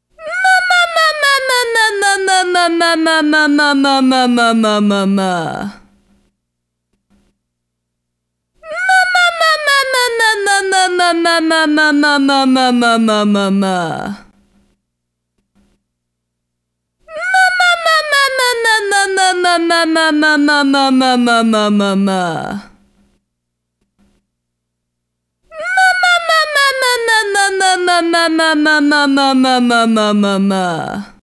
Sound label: Exercise Mum females